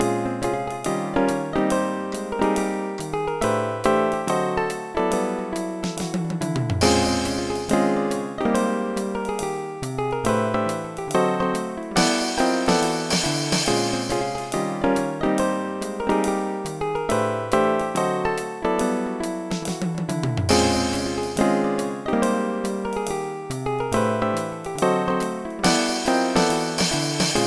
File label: solo; Dr. T's MIDI Recording Studio